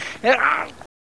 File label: pain; GoldSrc